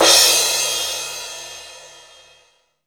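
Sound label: STEREO DARK, CYMBALS; CYMBALS 1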